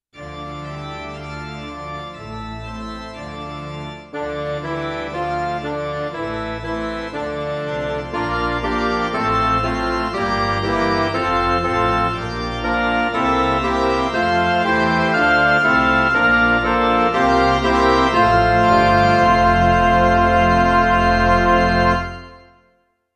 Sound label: midi